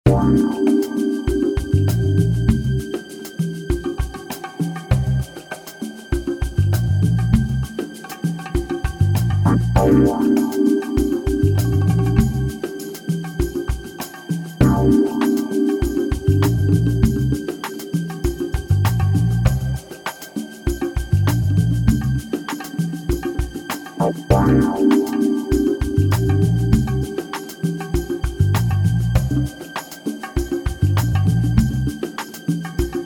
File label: Tense Intro 99bpm